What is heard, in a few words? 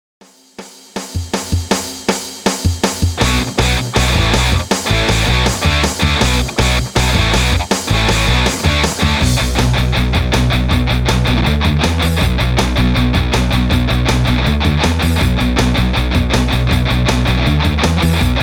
Sports 160bpm remix